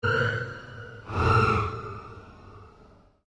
Created Cyber Whale Call, Alien Sound Effects

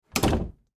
Door Hollow Wood, Door Hollow Wood Close; Household; Miscellaneous Doors; Sound Effects:Doors

